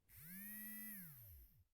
small drill

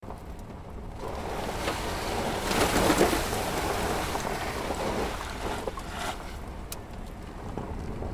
car through icy puddle

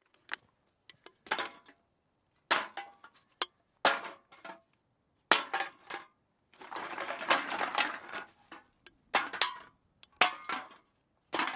counting cans close

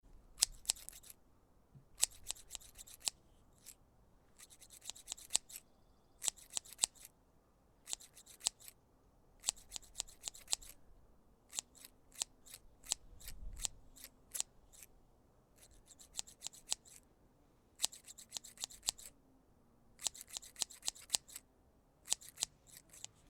grass clippers

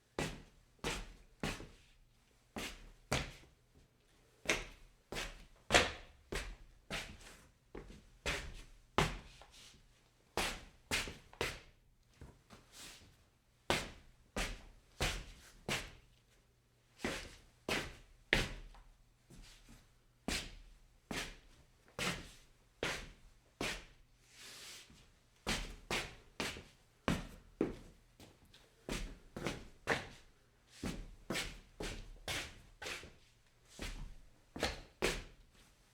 scuffing on stone

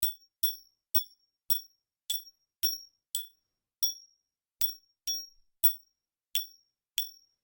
shell casings revolver

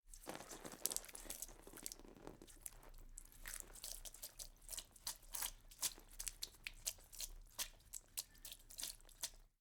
liquid spurt

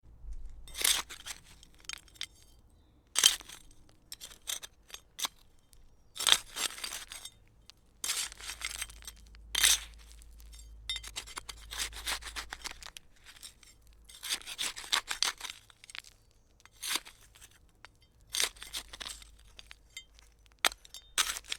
digging with trowel

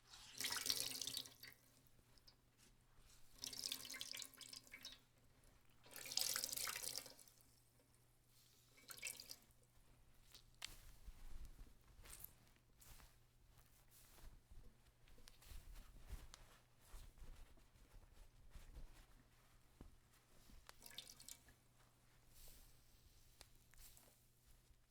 wet towel wring

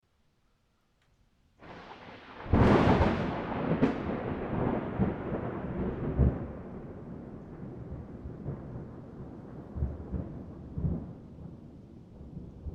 thunderstorm long

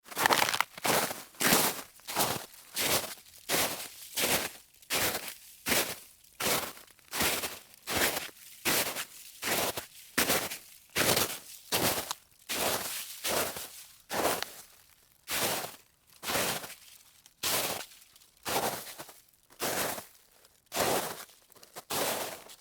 walk on snow series

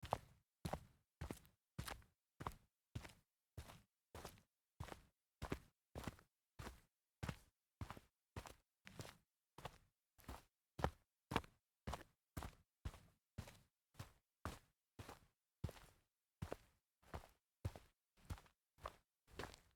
sneaker walk dirt, foley

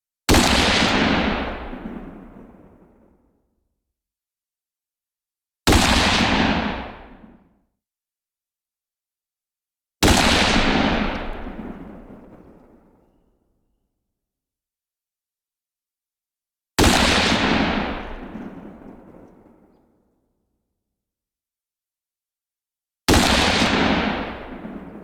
rifle shot echo series

